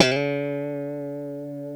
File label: D#3 FUNK, STRAT, Guitars; Spectrasonics; Hans Zimmer Guitars VOL-1